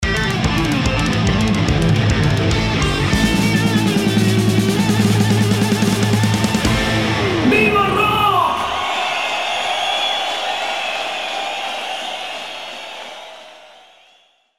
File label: JINGLE; VIVO ROCK